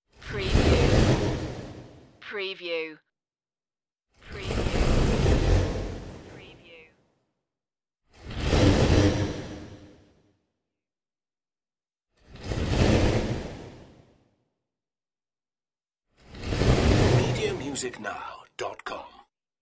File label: fire wobble whooshes